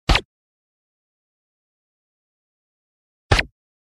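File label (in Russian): звуки выстрела из пистолета с глушителем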